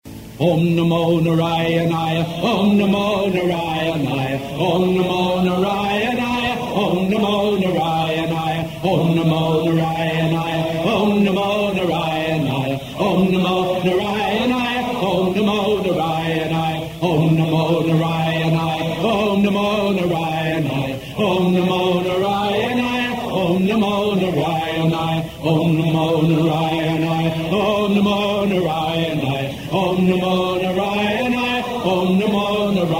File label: om namo narayanaya, mantra vishnu